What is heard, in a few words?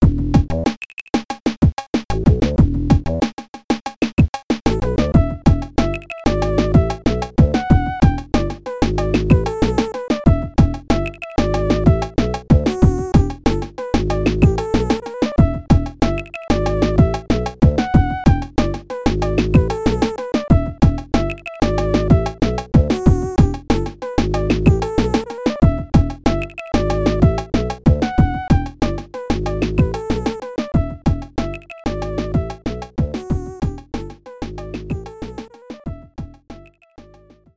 Loading Tune; Mysterious Worlds; amiga